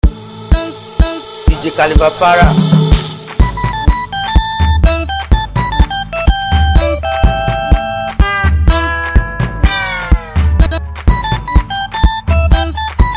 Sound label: free Beat